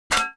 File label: metal, metal hit